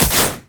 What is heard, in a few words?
grenade; rumble